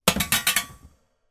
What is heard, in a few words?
impact metal grill on metal surface